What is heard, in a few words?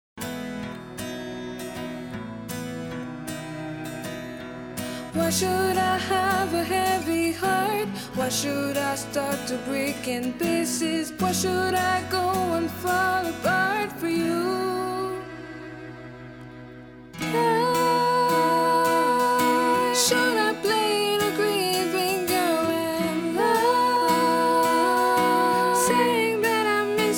hmmmmmm